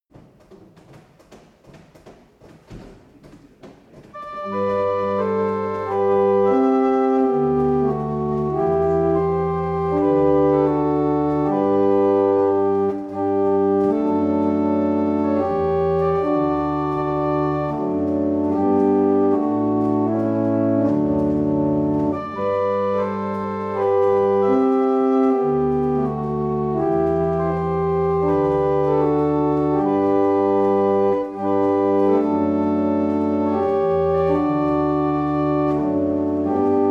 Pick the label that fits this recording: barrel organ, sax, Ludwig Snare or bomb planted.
barrel organ